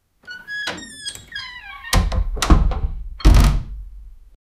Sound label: unlocking wooden door sou